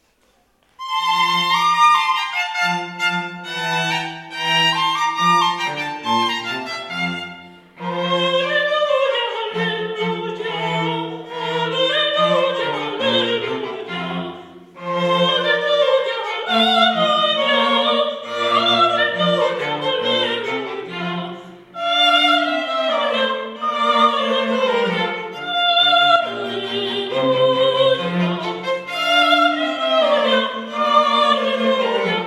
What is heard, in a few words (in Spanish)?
Aleluya Mozart soprano; Exultate Jubilate